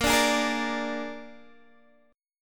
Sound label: G sharp Suspended 2nd Flat 5th A sharp, chord